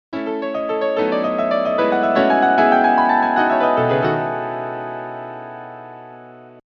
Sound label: MIDI